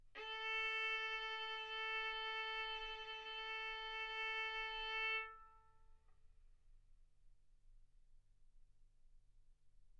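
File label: Violin arco mf A string A4 B4 stereo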